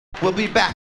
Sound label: fx and scratches